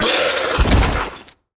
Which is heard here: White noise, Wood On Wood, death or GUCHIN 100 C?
death